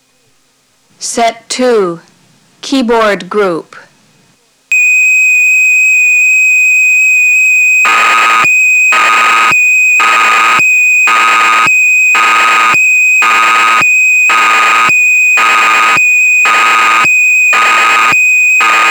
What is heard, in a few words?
Keyboard Group, dx9